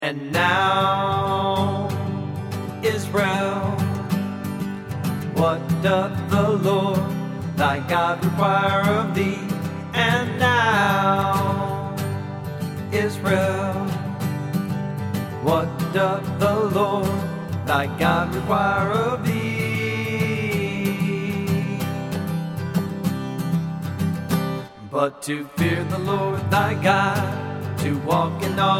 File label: Acoustic; Deuteronomy 10 12-13